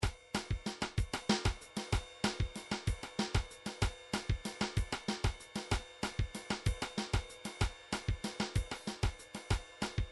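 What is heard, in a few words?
drums groove, midi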